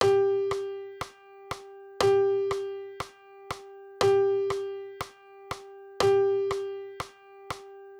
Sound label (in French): ronde binaire